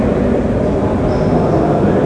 foyer Ambient; VOODOO

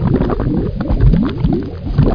lava

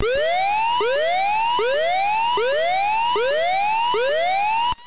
klaxon; wincastl

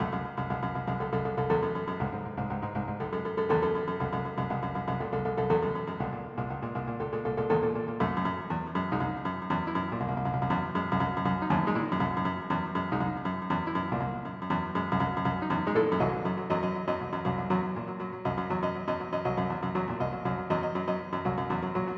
aust drum; General MIDI (type 1)

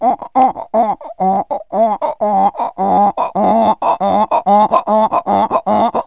seal; animals; Wired for Sound Pro CD; Aristosoft, Inc.

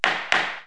Gavel (Two Hits); KABOOM! - Wild and Wacky Sound Software; City Life; Law & Order

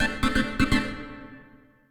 SYNTH HOUSE 125 A, Keys; eJay

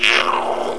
SPLAT; Death from Above

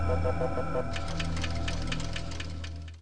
menu popup; ALIEN Commemoration

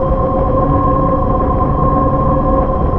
warp engine; Earth and Beyond; EA Games

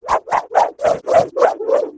falling sound

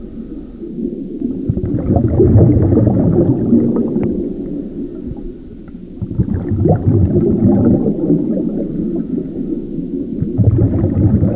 bubbles; Coral Reef 95 Screensaver

